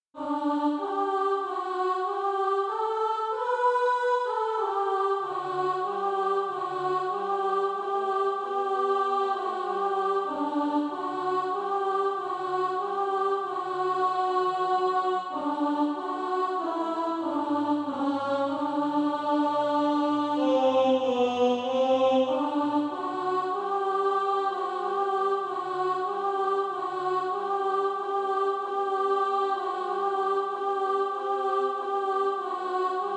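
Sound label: alto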